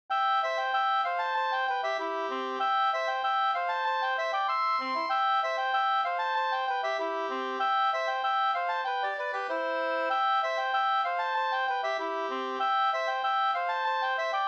halling; fr Osterdal no Nr1 2st

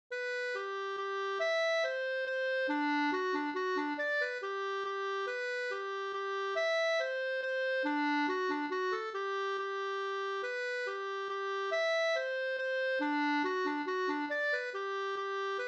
skanklat

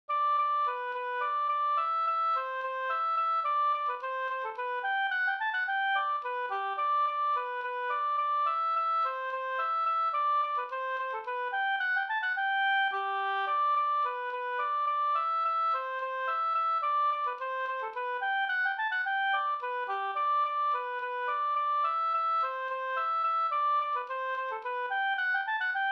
slängpolska

